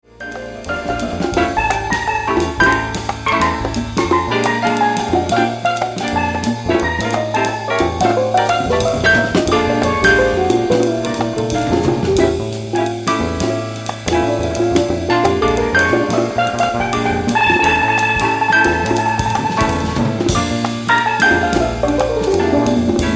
Flamenco Groove

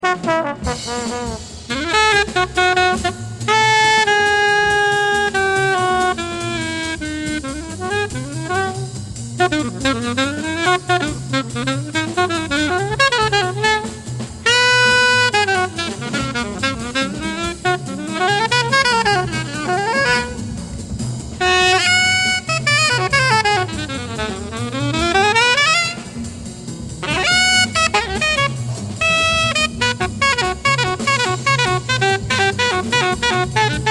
now jazz

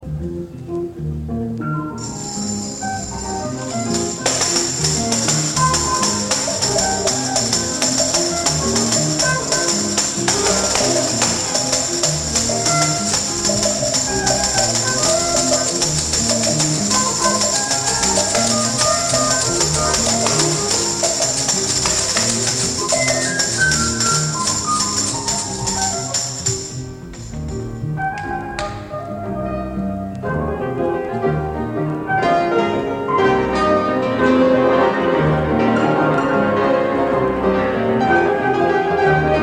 piano

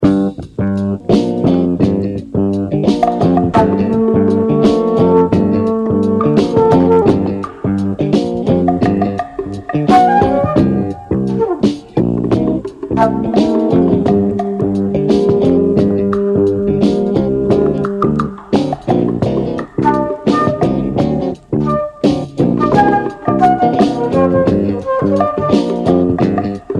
fluting

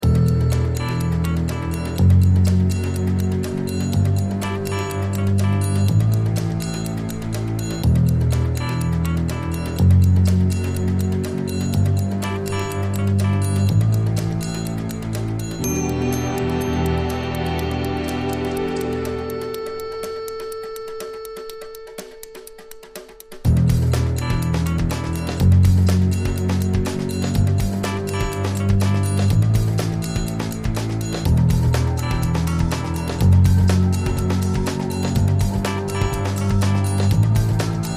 Jazz

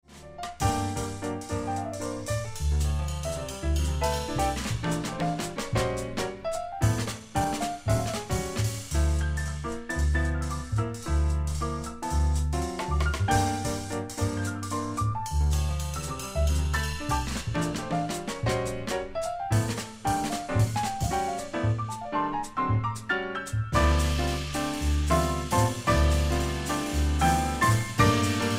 Blues Minor, Romanian Jazz

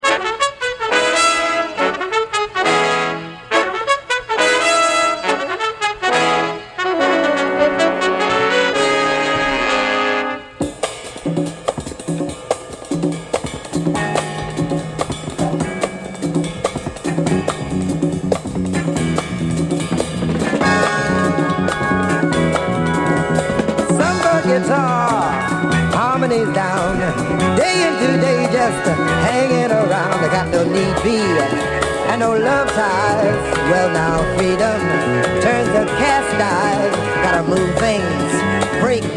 sombre guitar

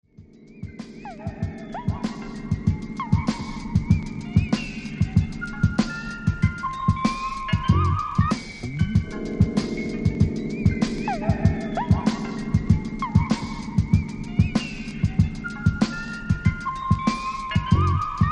Finnish Jazz Bonus Beats

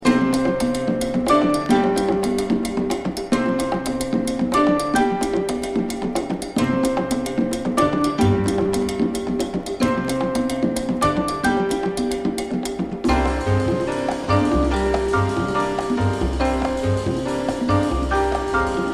Deep In Drum Time

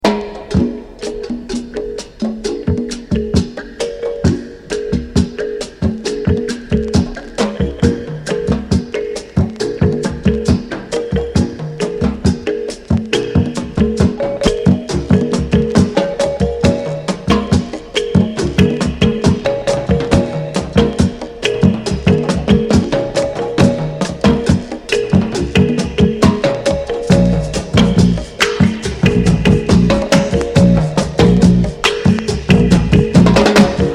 drum song

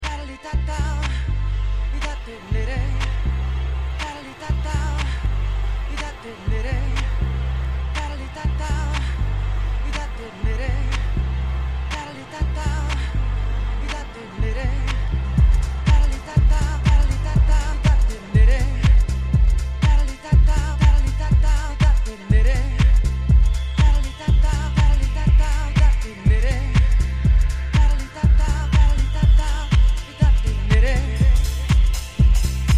Mute Horn

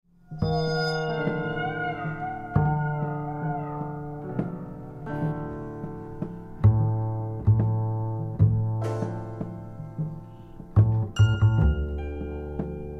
drum lesson

